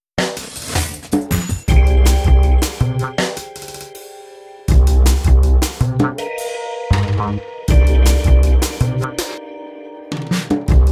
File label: techno